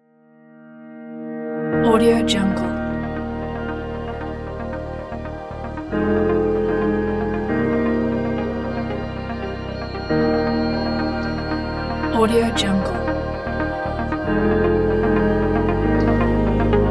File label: indie lofi